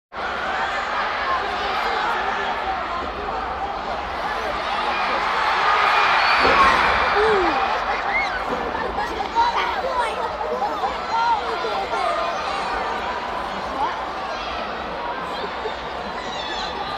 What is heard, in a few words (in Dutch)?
Worstelwedstrijd Publiek; KMP Film Video Sound Effects